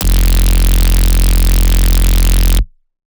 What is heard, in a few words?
Bass Shot F Hard, Dubstep; Ghosthack; Advent Calendar 2018